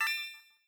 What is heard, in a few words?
Get Gem; Rotating Brave Switch